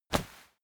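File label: step walk textile medium, footsteps; schritte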